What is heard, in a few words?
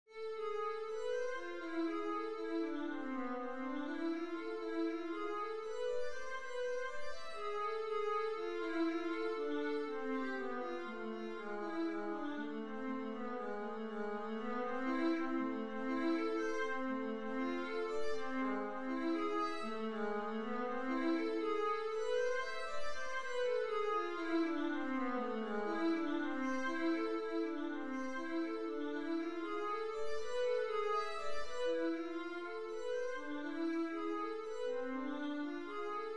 scale study minor key; lewis alastair